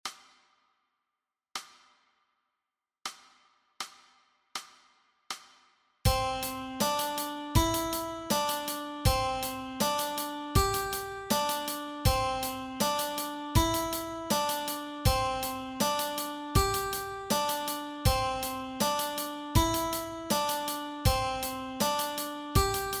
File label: Guitar Exercise